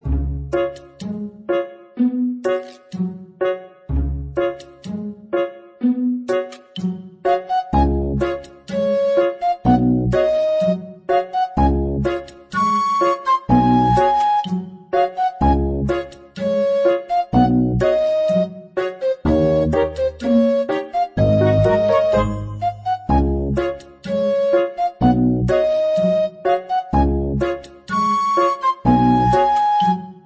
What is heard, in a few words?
bgm